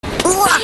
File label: combo break; Konata